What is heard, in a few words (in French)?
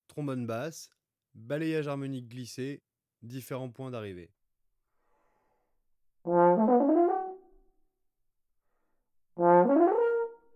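basse balayages harmoniques glissés différents points d'arrivées, trombone; Effets; traite orchestration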